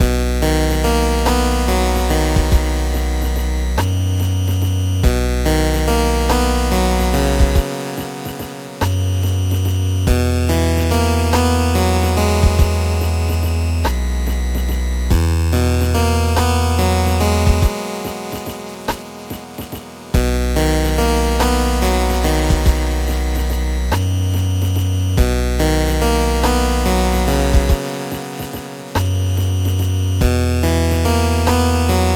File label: Dramatic Cutscene Loop; bat boy; ps4 ps5 switch windows xbox one xbox series xs